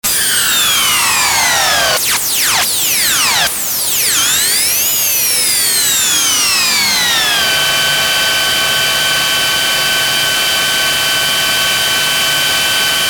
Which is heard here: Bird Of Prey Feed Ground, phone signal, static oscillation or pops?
static oscillation